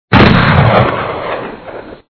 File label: wrong answer; buzzer